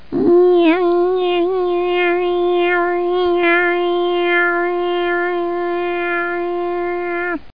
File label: BEE; Greatest Stories; fairy